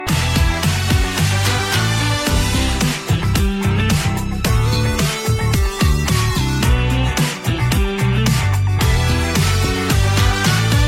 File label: samsung galaxy f54, ringtone